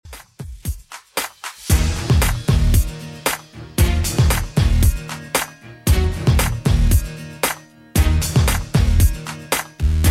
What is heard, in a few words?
2ks Redrum 115bpm CLEAN